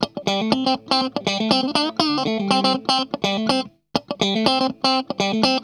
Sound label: STRUT, Funk Guitar; 90 s Sample CDs; Zero G